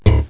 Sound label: slap